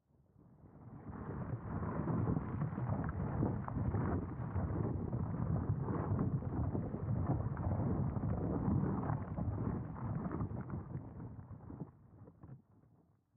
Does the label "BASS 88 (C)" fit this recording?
No